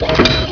sidewinder ready; KATANA; Daikatana

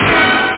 horn blast; Zoom - Release 2; Active Software; simon

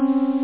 wrong ans

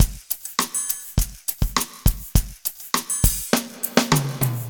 drum

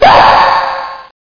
space die

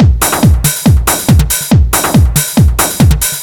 Cream B, Techno Drums; TechnoMusicMaker

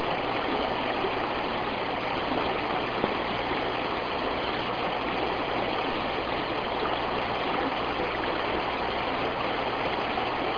WATER

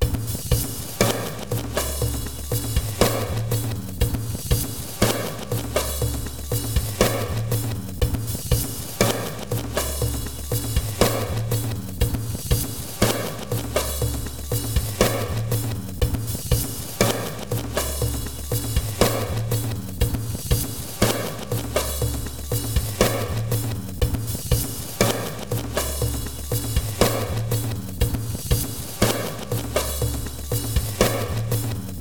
DRUMS, 120 BPM

